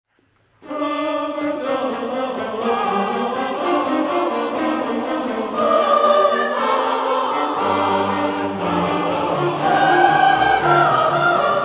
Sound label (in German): CHOR Amen